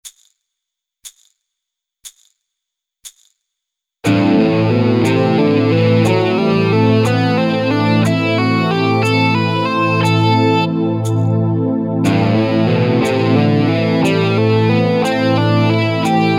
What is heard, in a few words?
Metal Bootcamp Ex